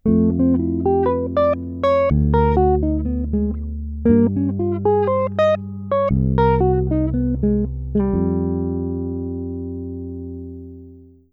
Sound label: min arp in f on 3rd